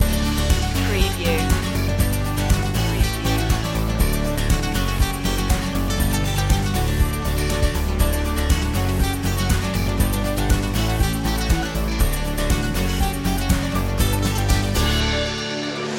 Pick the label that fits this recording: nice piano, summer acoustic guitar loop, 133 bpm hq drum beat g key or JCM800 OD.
summer acoustic guitar loop